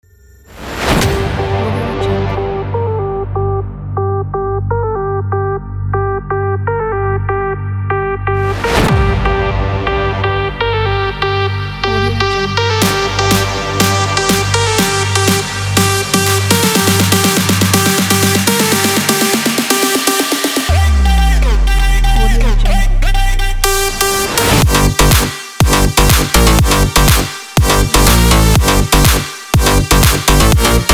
sport electro

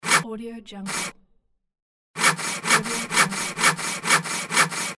wood sawing

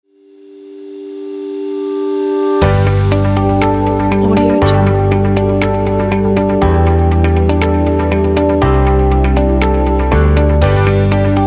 minimal simple background

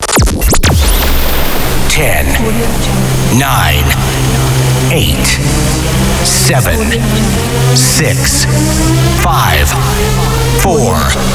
countdown

